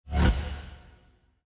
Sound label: roll